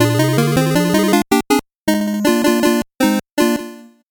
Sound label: 8bit jingle normal